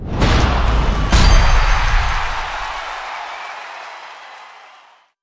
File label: random wild hit; football